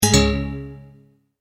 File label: multimedia tone error; Tones; Sound Design